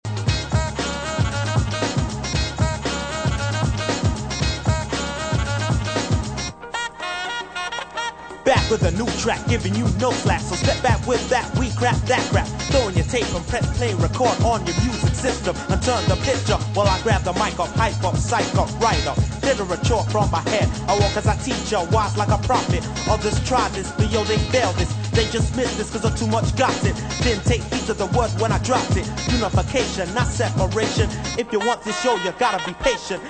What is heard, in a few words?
intro rap refrain